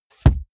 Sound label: car bmw e46 interior sun visor shut soft